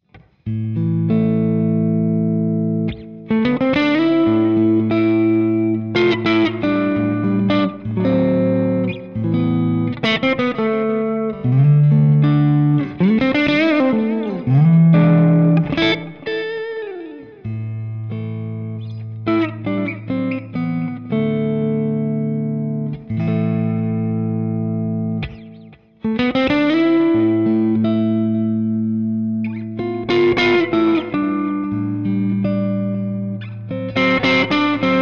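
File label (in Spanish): sucio, guitarras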